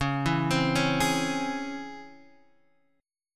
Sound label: Db Minor Major 7th Db; DbmM7 arpeggiated; DbmM7 chord; JGuitar